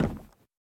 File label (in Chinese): 錾制书架 踩踏声